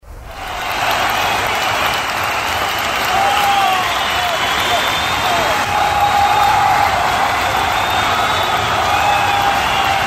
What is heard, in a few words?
crowd cheering